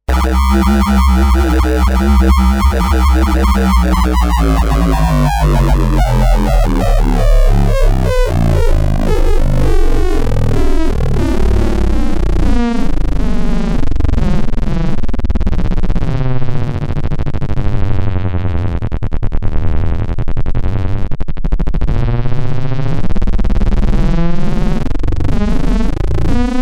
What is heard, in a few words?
robotic bass; fernando tanzo